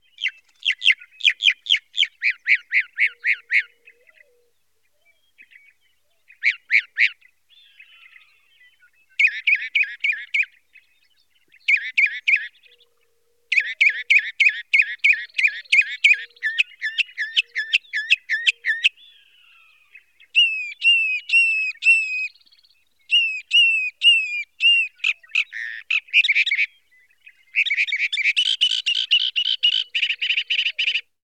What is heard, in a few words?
Bird Mockingbird